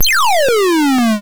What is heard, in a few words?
FX Zoom Shoot; SFX; YM; CHIPSHOP DELUXE; CHIPSHOP HITS